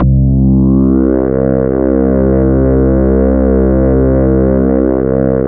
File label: Oscar bass; Big Fish Audio - First Call Vintage Keyboards; OSCARBASSICS